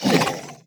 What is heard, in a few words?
Monster Effort Big; Monster Lizzie Effort Big